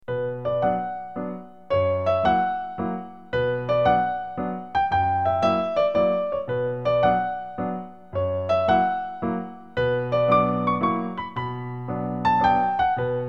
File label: waltz in b minor, piano